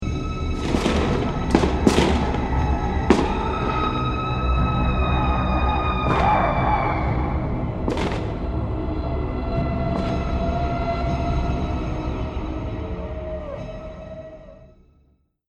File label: Fantasy Battle Scene; Battle Sound Effects